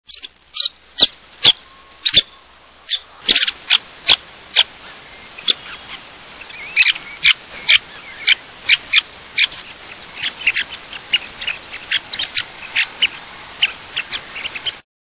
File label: Semipalmated Sandpiper, songs